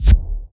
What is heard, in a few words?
impacts; World of Tanks